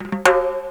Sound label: INS TIMB RL, TIMBALS, Ragga & Dub Reggae